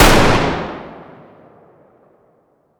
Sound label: fire dist int rifle; gmod; arccw uc commons attachments